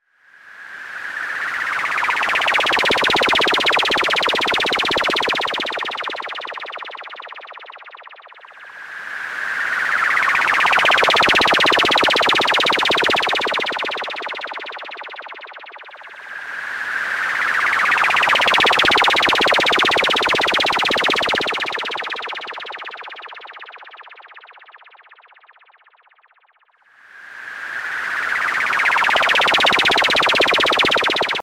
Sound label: OB-E Old School FX